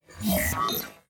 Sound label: reversed